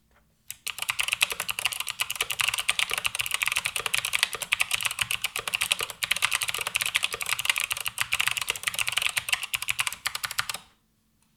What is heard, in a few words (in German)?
GK71 Sonic Blue normales Tippen; MSI